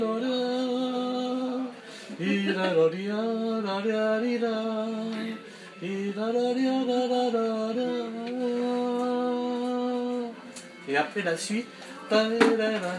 Plinn Le Bal Chanté

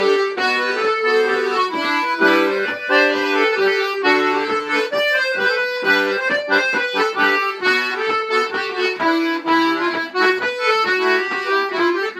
Plinn Ton Double Ensemble

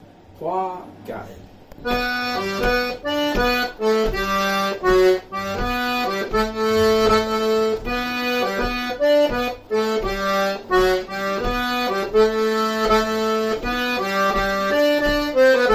Gavotte Lent Et Rapide; Gavotte Pourlet

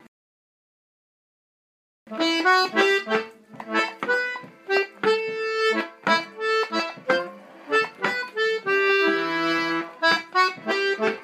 Gigue Irlandaise

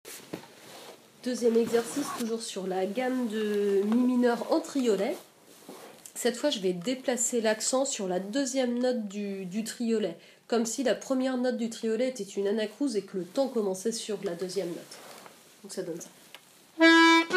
Gamme En Triolet Changement Du Temps Fort

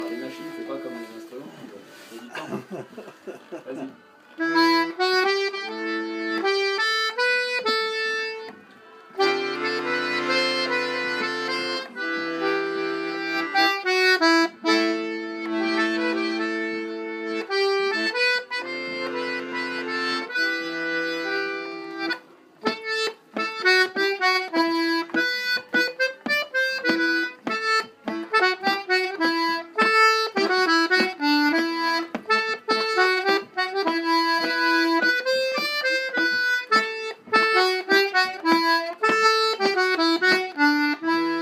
Plinn Le Bal Gamme de Mi Mineur

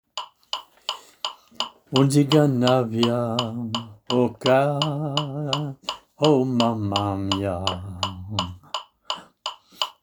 Tsigan chant au rythme; Scottish Un Tigan

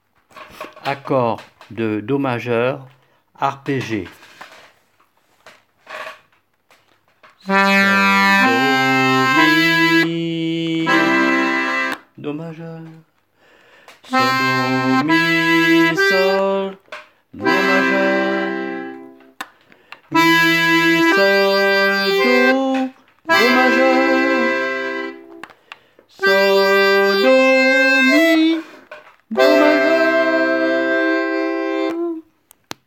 Do maj arpégé

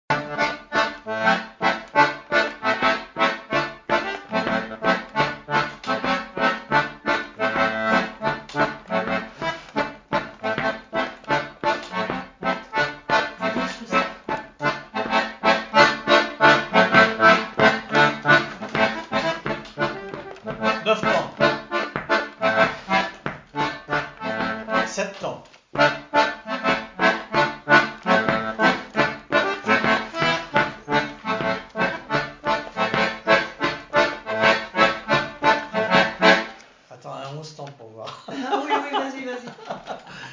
rythmique

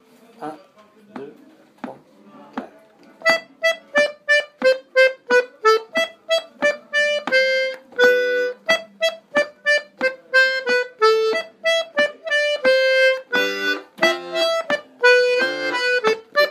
Plinn Ton Simpl

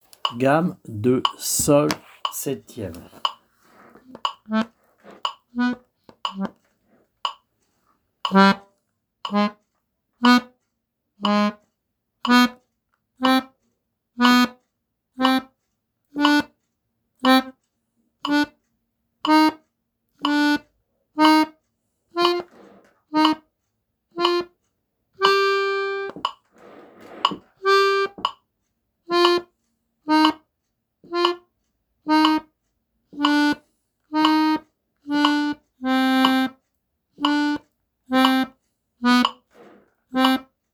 Gamme de sol 7ème

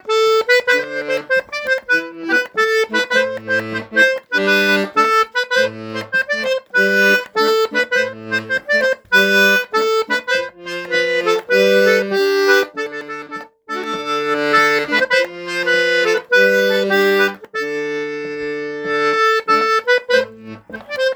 Rond Melodie; Ronds Du Quercy

